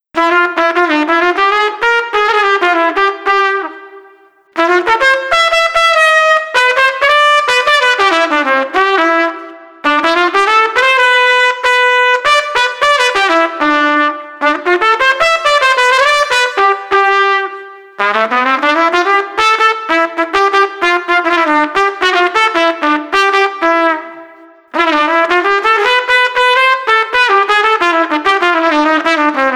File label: Street Trumpet Festive Melody 96